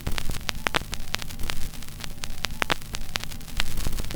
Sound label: crackles, Vinyl